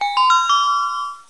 D I N G, wheel of fortune

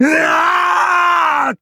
aargh

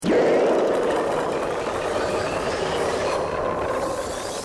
flaring gong

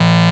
show buzzer

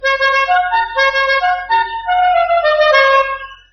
la cucaracha; La Cucaracha car horn

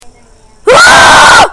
aaaaahhhhhh

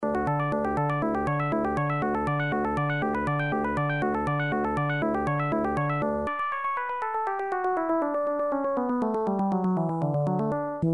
video game music; The Noid

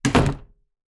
street door close

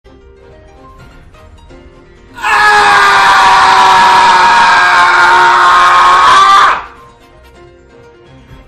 AAAAAAAAAAAAA

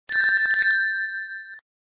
rings drop; sonic

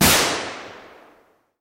stag shot by gun

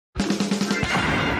door opening sound effect; detective conan

